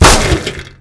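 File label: grass; Mafie 1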